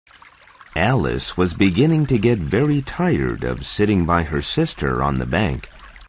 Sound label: correct